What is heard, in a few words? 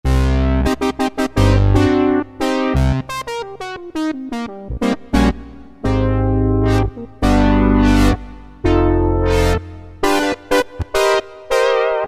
Brass, Roland MKS-50; Factory examples